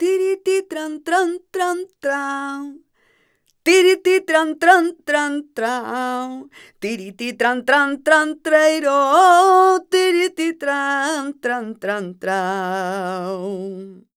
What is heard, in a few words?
voc f#, Flamenco, Vocals, Female Cantaora; Female Cantaora 2